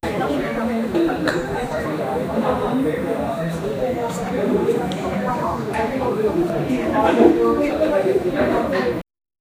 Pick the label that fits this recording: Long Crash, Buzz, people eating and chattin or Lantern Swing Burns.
people eating and chattin